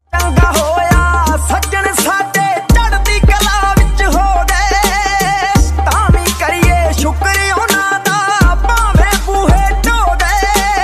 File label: Punjabi Tune Ringtone